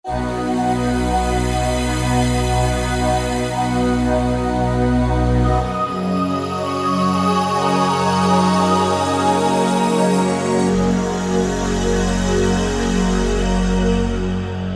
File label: Yamaha TG77; Factory Presets; ME Voyager